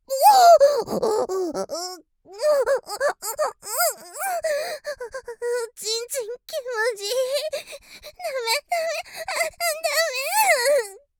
おほぉっ！♥おっ！♥おっ！♥おっ！♥

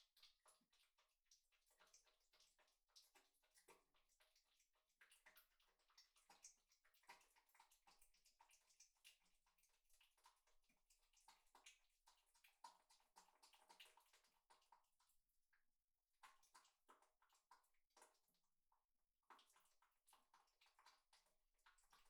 クチュ音 穴 普通の; KU100; アダルトな音

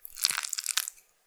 普通, 挿入 or 引き抜かれ終わる直前; ■挿入or引き抜く

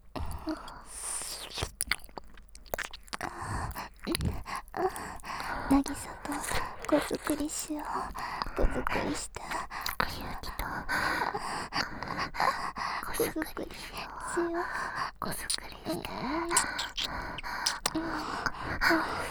耳舐め 喘ぎ

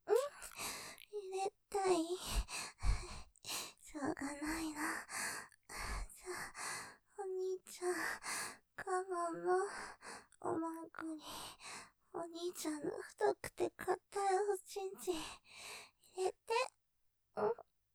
喘ぎ; 入れたい?しょうがないなぁ…ｗ太くて硬いの入れて; ループで楽しむ！シェフの気まぐれ抜き抜きコース

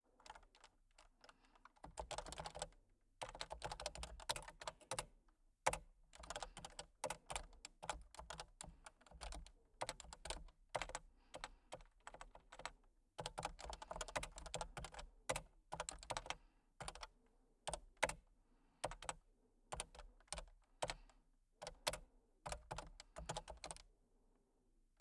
タイピングと呼吸音

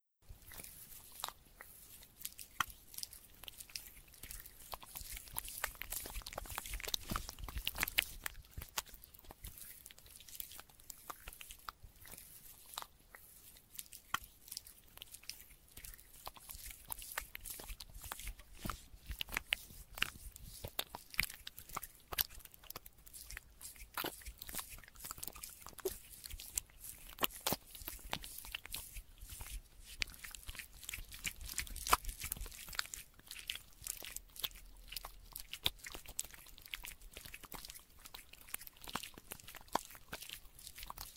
体のオイルマッサージ; 純奈パート

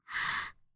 ブレス ミディアム

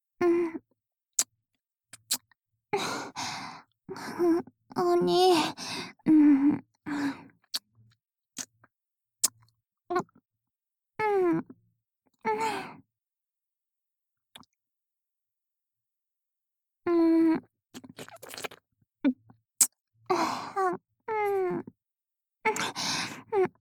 次女 キスボイス

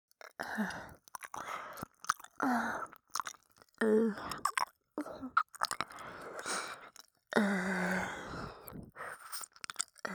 右耳舐め

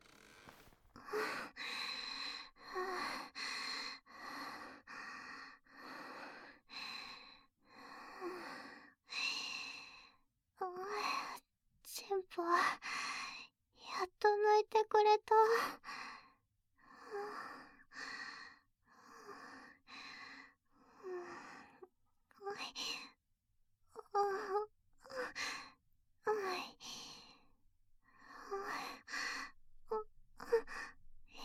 バイノーラル えっち

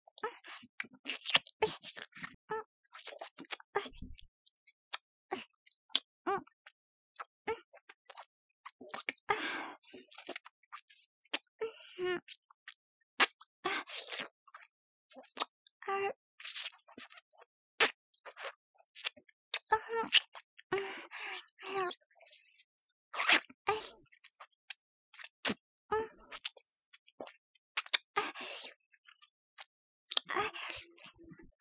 フェラ音ゆっくり

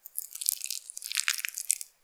挿入or引き抜かれ終わる直前 普通; 挿入or引き抜く

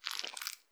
膣内射精

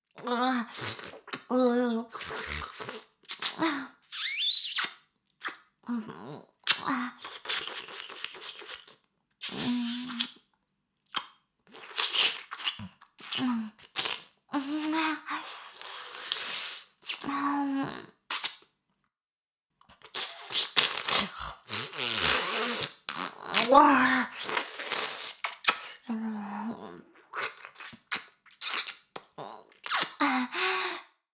耳舐め音声; 少尉; 牧場戦腺

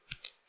くちゅ音単発

